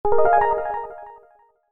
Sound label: Arrive, notifications; Explay; Rio-Play